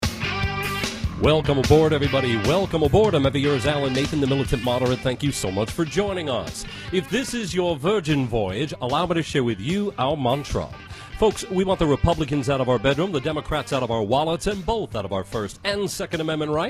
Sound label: mantra